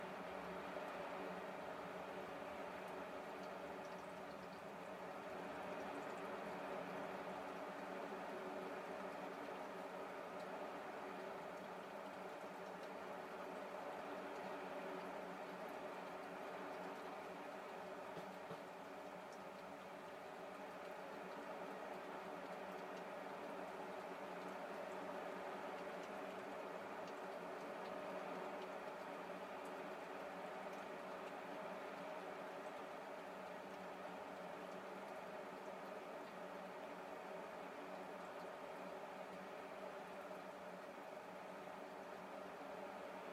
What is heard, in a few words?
sound slate